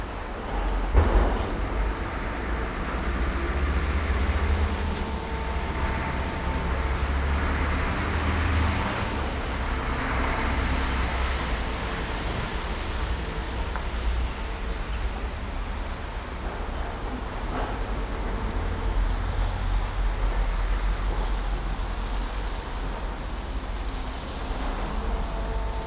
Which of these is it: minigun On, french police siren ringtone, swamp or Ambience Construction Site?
Ambience Construction Site